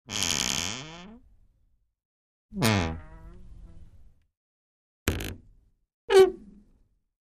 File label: Door Wardrobe Creak Short; hinges creaks